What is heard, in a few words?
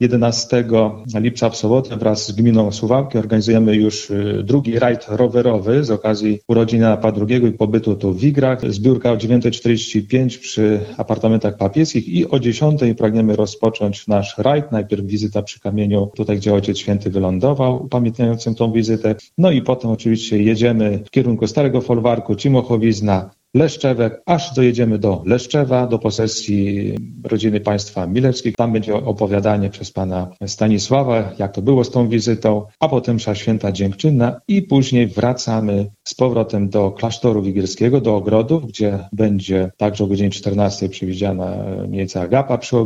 blues